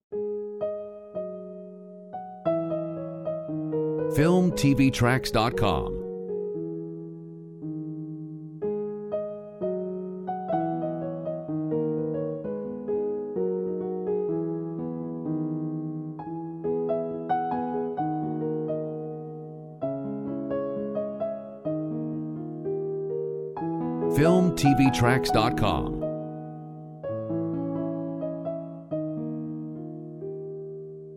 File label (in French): Piano romantique